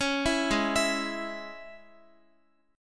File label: Ab Suspended 4th Sharp 5th Guitar; arpeggiated; Absus4#5; x,x,11,9,8,11